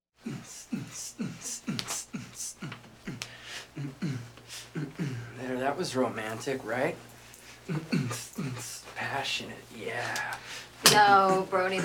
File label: Techno Boogie; Post Coital Techno Boogie